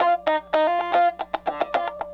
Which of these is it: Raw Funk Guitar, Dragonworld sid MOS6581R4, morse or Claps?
Raw Funk Guitar